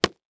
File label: Impact Boxing Glove Hit; Impacts